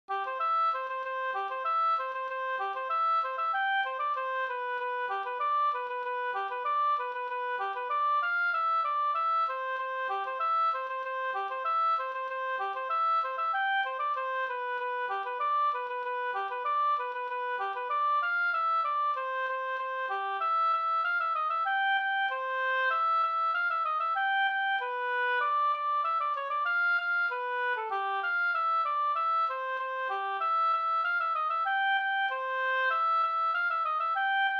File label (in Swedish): snoa Jamtsnoa C F dur